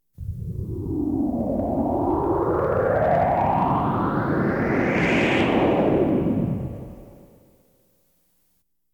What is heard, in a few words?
Science Fiction effects, Lasers, Light beams